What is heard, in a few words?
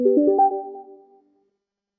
synth pop